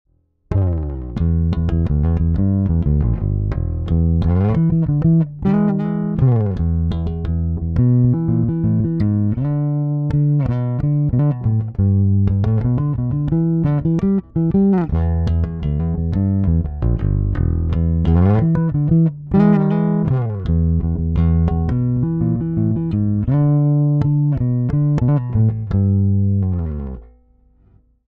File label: Neck Active Rock